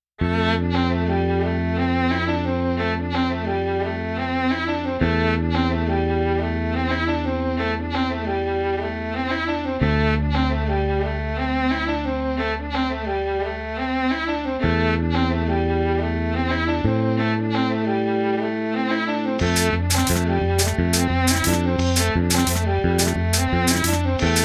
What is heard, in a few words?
riff rain